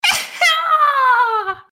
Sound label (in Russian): udar